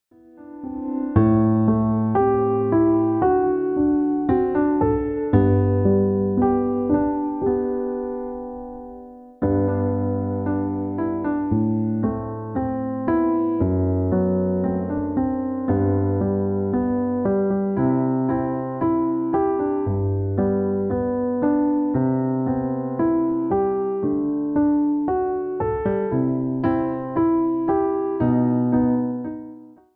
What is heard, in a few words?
background music